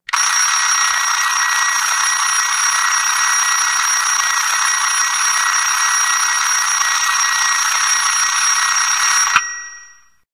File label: alarm clock alarm; source engine; tf; pl hoodoo; video games